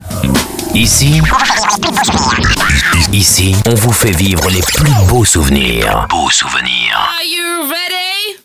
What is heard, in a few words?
jazz; Jazz de coeur